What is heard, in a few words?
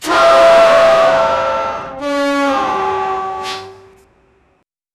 chaotic melee of iron wea, combat